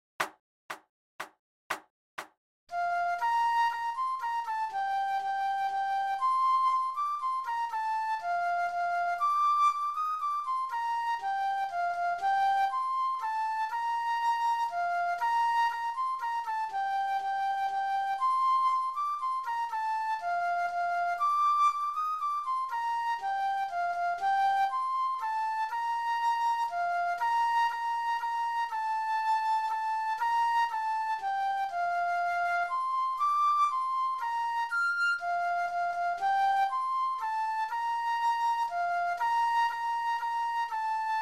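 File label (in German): Floete, 40 bpm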